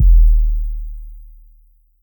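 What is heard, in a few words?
Dist 808, distorted; 808s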